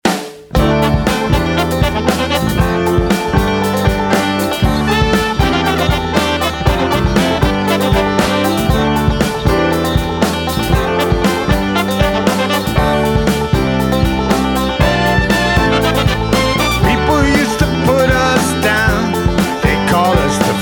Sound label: Country Funk Music